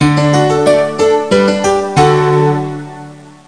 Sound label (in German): BELOHNUNG